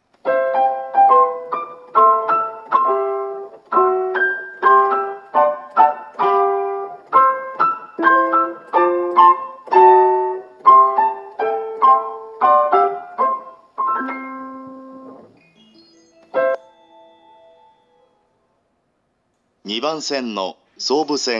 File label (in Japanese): 発車メロディー（たなばたさま）; 阿佐ヶ谷ＶｅｒＢ; ２番線